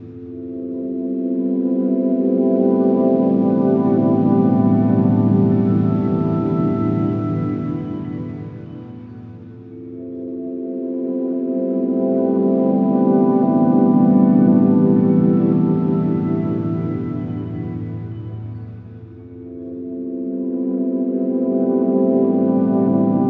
texture dream A Min, One Shot Textures; Transmission-X

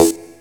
SD HOU, Dance Mega Drums; Best Service

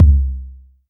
Jungle Kick, Roland SH-32 Drumset

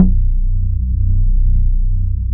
BASS, Bass Analog & Synth; VIBE D.; USB Soundscan vol.12; AKAI

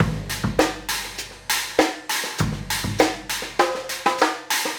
Trap Kit, Drums

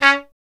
TP C#; Miroslav Vitous; Solo Instruments VOL-1

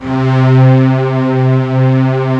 MIX STR C2, Strings; AKAI S6000 CD-ROM - Volume 1

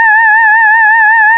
LEAD, Nord; Wizoo - Powered Nord; LEAD PAD HRN

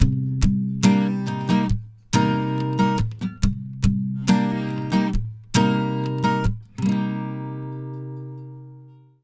70 MIN CHD C, Acoustic Guitar Loops; USB Soundscan vol.04; AKAI

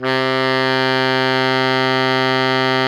baritone mf C 2, saxophones; Greytsounds - Philip Wolfe David Crozier's Saxophones

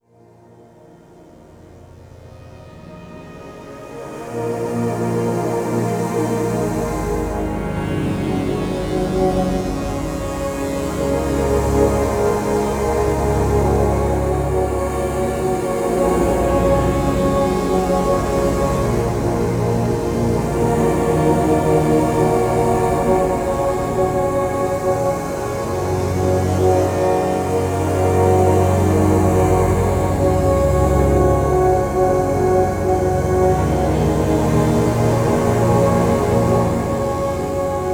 Low Long Complex, Complex Wave; Sonic Foundry (Sony Creative Software) - James Johnson Spektral Minimalism

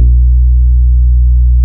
TAURUS C3, synthesizer

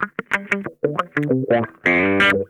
CRO MAGNON, Funk Guitar; Zero G - Funk Guitar

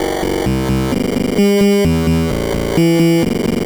MiniBrute Loop, 130BPM; musicradar; bitcrushed; Crushed Loops

